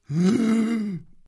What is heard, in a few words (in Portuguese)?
tamandua